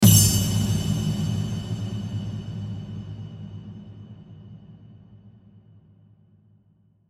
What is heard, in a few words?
Hit Drum Jingle, Hit Drum Jingle Reverb; Sound Effects:Musical; Blastwave